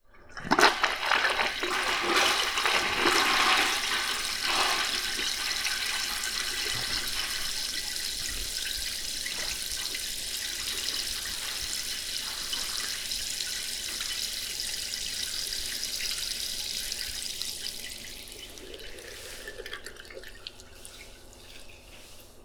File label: Urinal Multi Close; InspectorJ